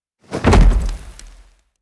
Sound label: colossal land; netent